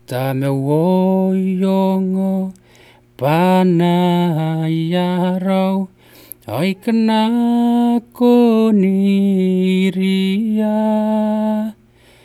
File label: song, kiribati; Tamau o i ongo bwanaia raou